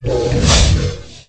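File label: creature tanray lizard attack heavy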